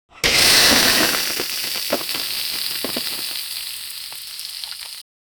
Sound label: intense sizzle and crackl, cooking